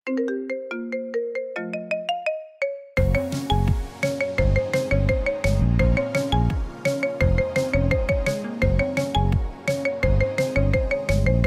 Marimba Remix

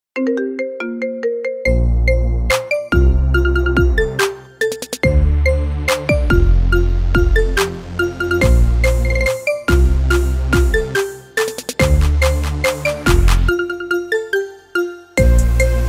Marimba Remix

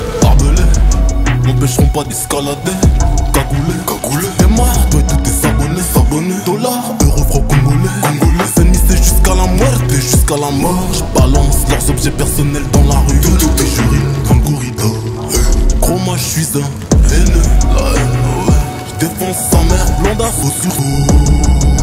hiphop

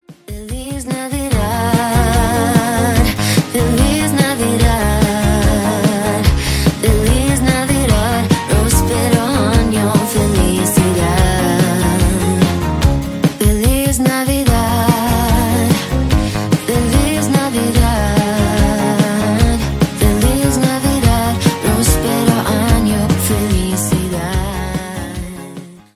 Acoustic

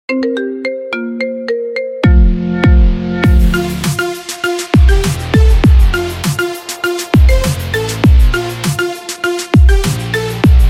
Marimba Remix

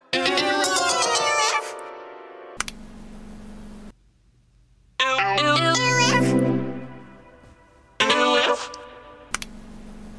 PlayStation Portable

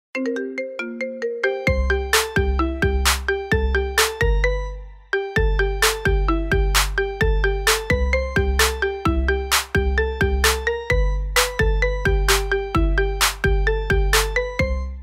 Marimba Remix

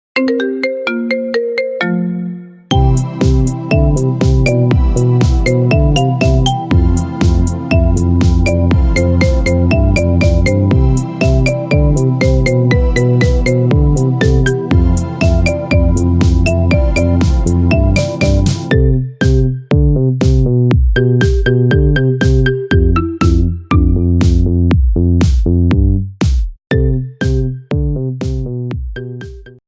Marimba Remix

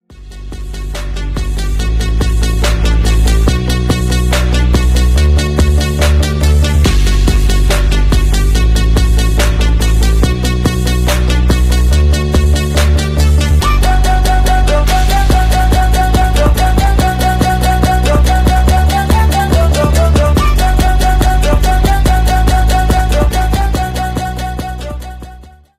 ringtone remix

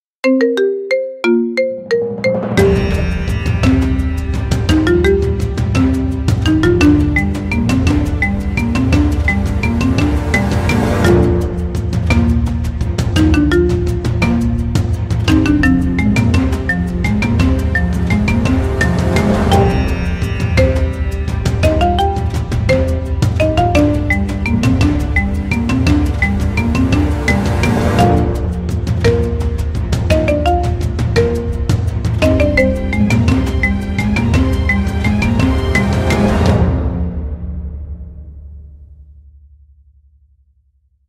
Main Theme Marimba; Game of Thrones

